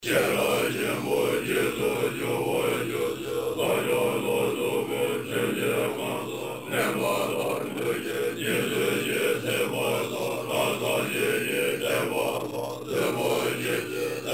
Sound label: tibet melodies